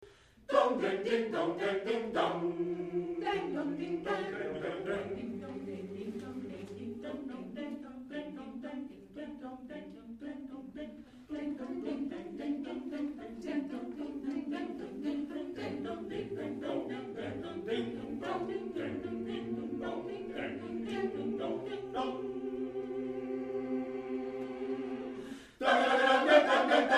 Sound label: Gamelan